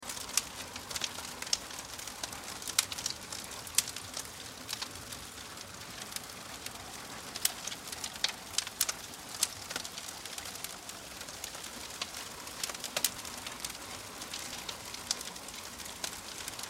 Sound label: Fire Crackle, Fire Crackle Cardboard Leaves Wood Loop; Flames; Flame Sound Effects